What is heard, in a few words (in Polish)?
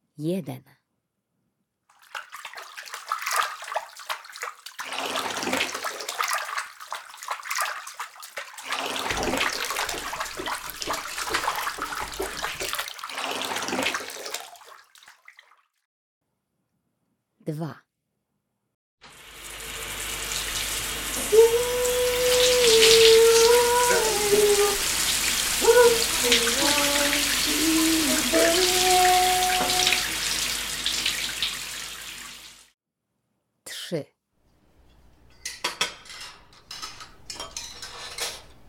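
woda, odgłosy; starsze przedszkolaki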